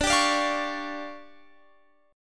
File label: D Minor Major 7th Double Flat 5th, D, Guitar Open F; DmM7bb5; strummed